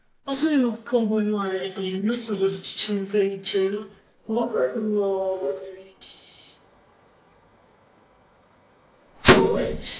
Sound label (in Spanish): un efecto de suspense ant, television